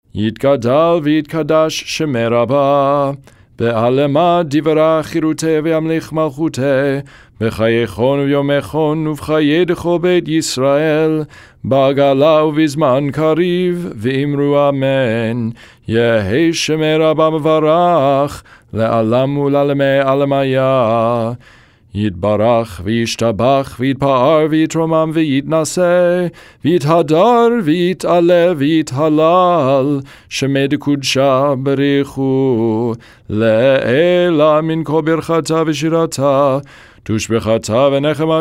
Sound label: kaddish shalem weekday minor